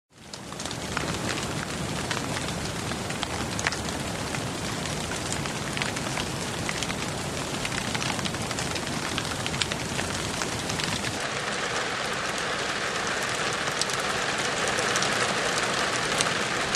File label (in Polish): Nagranie odgłosów wody